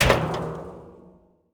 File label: water catcher deploy; Rust